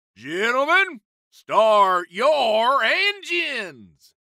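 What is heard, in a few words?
Surprise Sound; Victory Lane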